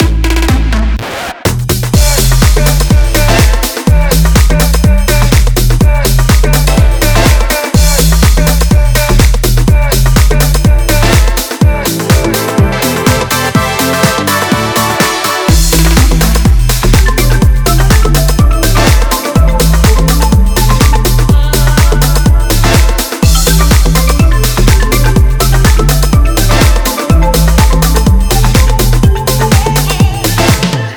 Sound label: EDM